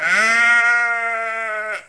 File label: grey goat die